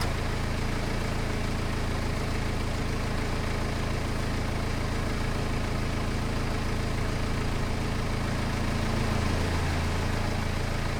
car motor parado; Steven Universe - Beach City Drifters

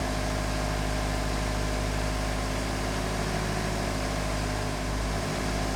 car motor andando; Steven Universe - Beach City Drifters

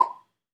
ui transicion; Steven Universe - Beach City Drifters

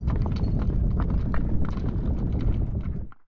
sfx temblor; Bunnicula - The Cursed Diamond

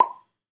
ui transicion; Steven Universe - Beach City Drifters

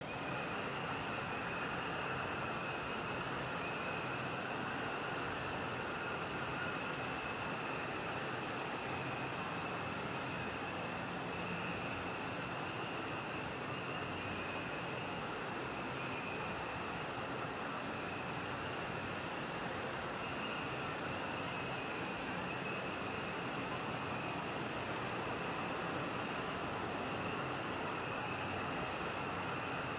ambiente playa; Steven Universe - Beach City Drifters

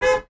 claxon; Steven Universe - Beach City Drifters

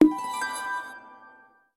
sfx estrella; Bunnicula - The Cursed Diamond

